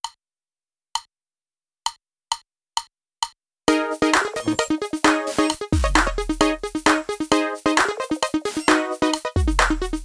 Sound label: drumless